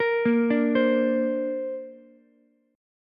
Bb Major Add 9th, Bb, down, Ukulele, C6; arpeggiated; JGuitar

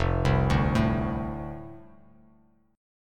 F 6th F Bass Standard; F6 arpeggiated

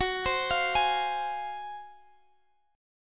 F Minor F sharp, chord; arpeggiated

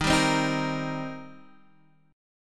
D sharp 6th, D sharp, down, Guitar, DADGAD

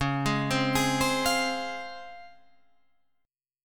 C sharp Major 7th C sharp Guitar Drop D

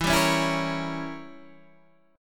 E Diminished 7th Guitar Standard; Edim7 strummed; JGuitar

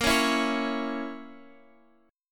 A sharp Diminished Guitar Open F, chord; strummed; JGuitar

